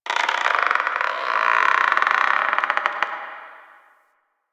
Tree Creak; Ambience; One Offs; Warframe; Quests; The New War; Drifter Camp

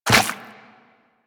Run Goo, Footsteps; Warframe; Tenno

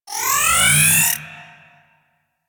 Library Scanner Scan, UI; Warframe

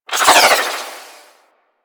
Arch Wing Prime Dodge Roll Left; Warframe; Vehicles

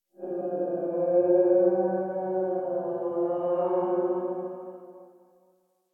Exterior Distant Calls Angry, Ambience; Warframe; Duviri

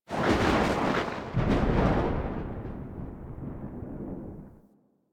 Landscape Thunder Crack, Ambience; Warframe; Venus Open World; One Offs

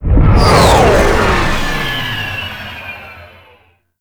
Supply Ready; Tau; Twelve Minute War; Supply Beacon

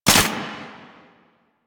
Archgun Low Clip; Warframe; Archwing; Weapons

